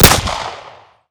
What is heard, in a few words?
beretta